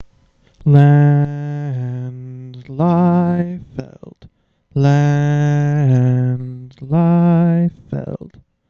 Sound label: ahh ahh